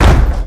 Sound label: KICK; RnB